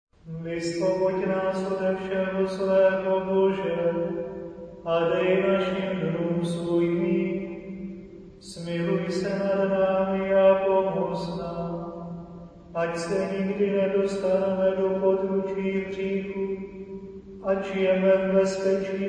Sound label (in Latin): embolismus choral